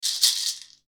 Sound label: maracas